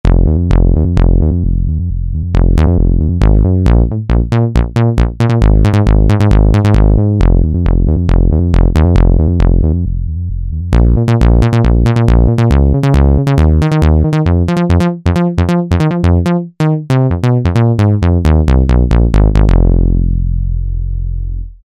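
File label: SH-2 Bass; Roland SRX-07 Ultimate Keys; SH-2 Bs SRX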